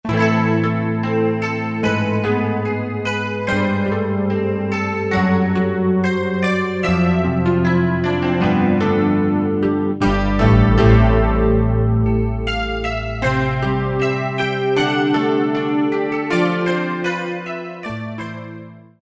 PL, Yamaha TG77; Factory Presets

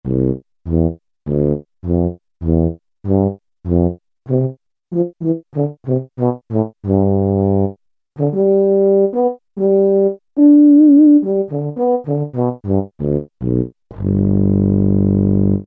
BRS F Tuba; Brass And Woodwinds; Roland L-CDX-03

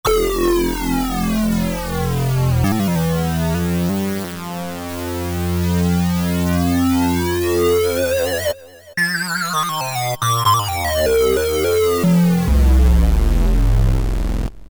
Korg MS2000; Factory Patches

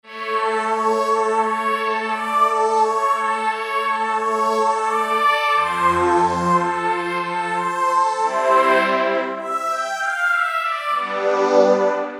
2 voices; Korg MSC-12S Synth 3

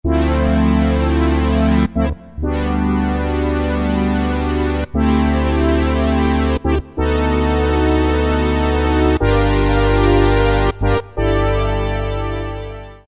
Brass Swell, Roland MKS-50; Factory examples

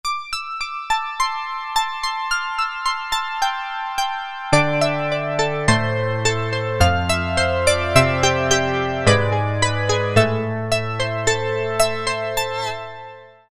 Syn-Bello, Roland MKS-50; Factory examples

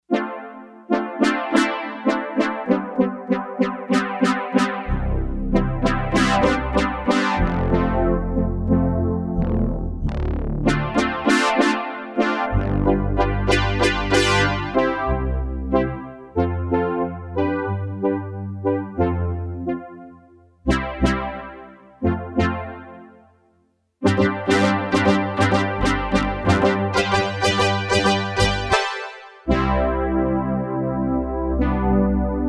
Reverb Brass, Yamaha TX802; Performances; Factory Patches

